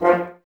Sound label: F, Brass, F.Horns, Woodwind Brass Ensemble; Sample CDs; Miroslav Vitous